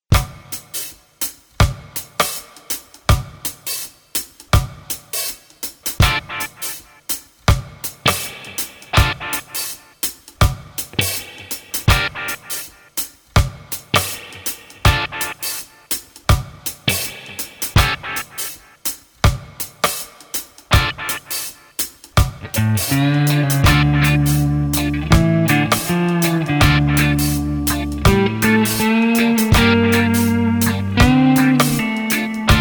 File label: Spaghetti Reggae